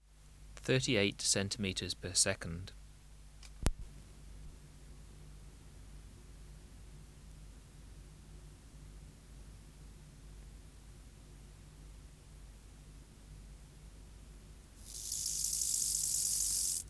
Chorthippus biguttulus

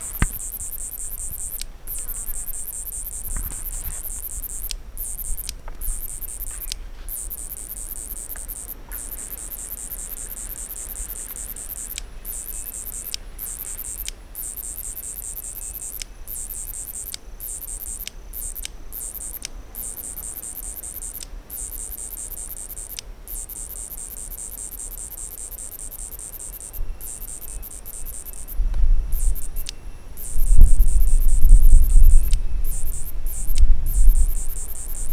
Oncotympana pallidiventris; PH

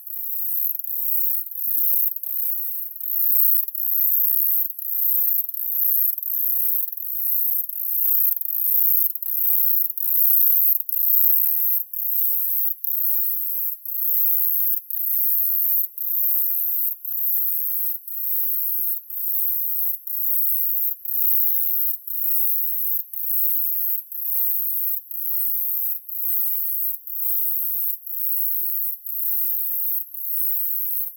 Myopsalta crucifera; Woodgate

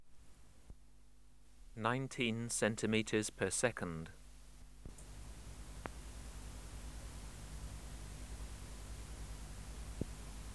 Omocestus antigai

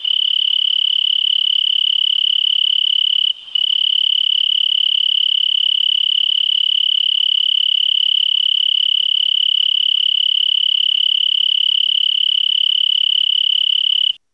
Oecanthus pictus, 19degree Celsius

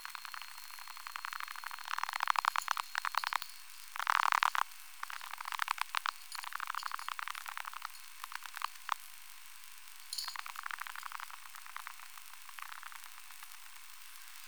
Rhinolophus affinis

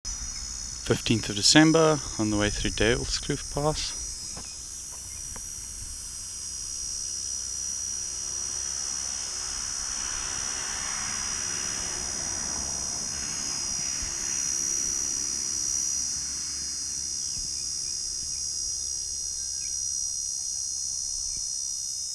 I.leopardina Duiwelskloof Pass

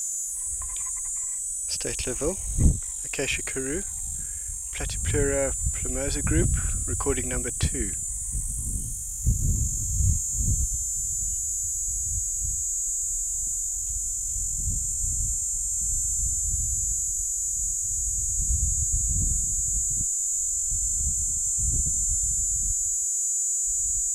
P.plumosa Steydtlerville

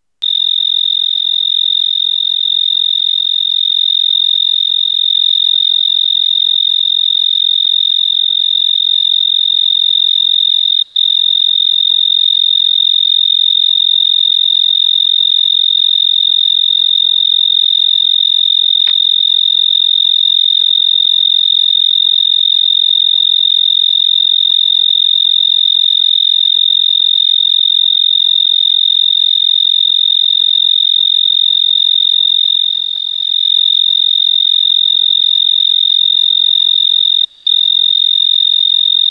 Oecanthus pictus

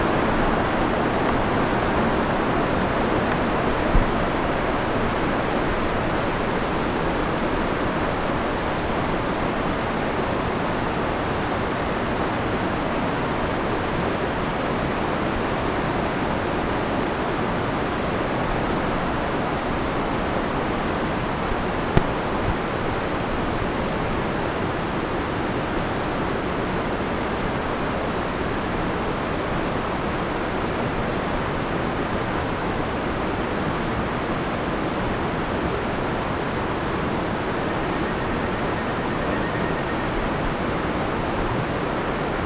Scieroptera sanoenesis

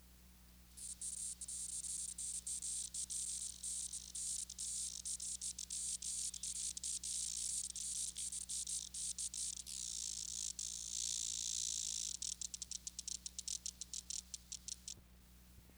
Clidophleps distanti disturbance

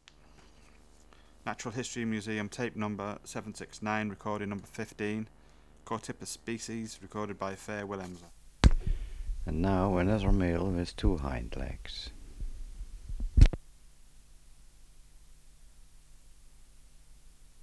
Chorthippus

